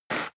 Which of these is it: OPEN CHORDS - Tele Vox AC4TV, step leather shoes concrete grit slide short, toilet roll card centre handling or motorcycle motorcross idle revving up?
step leather shoes concrete grit slide short